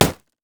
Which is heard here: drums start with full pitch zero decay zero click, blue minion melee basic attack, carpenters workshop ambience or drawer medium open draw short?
blue minion melee basic attack